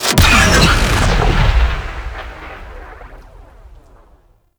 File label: Rail Jack Ship BFG Fire; Warframe; Vehicles; RailJack Weapons